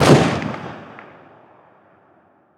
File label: g3sg1 distant; weapons; Counter Strike Model Pack; CS2